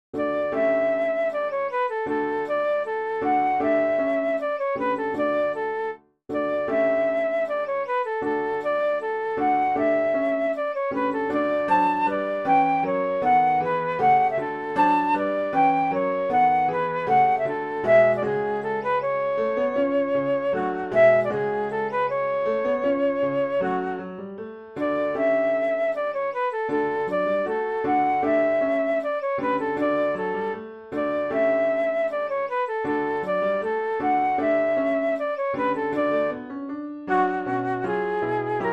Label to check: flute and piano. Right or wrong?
Right